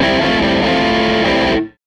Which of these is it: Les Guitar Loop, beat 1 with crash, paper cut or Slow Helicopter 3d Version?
Les Guitar Loop